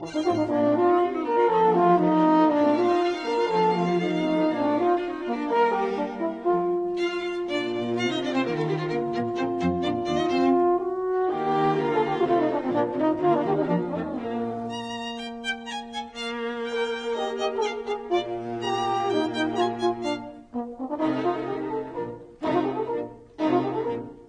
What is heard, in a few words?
Horn Quintet in E flat major, Allegro; Mozart; K 407